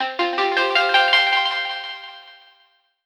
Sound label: Spring Board